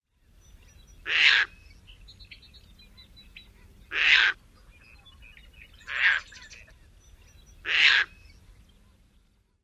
grating calls

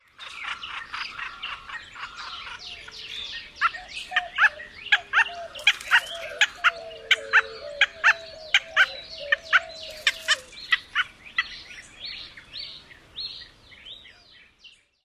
interaction calls; TX

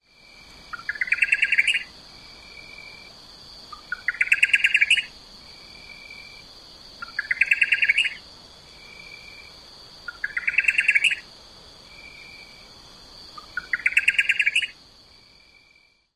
song; MEX

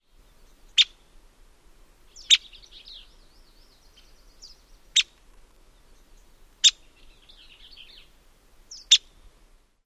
peek calls; TX

